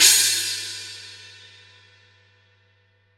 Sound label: 14 China up6; Digital Sound Factory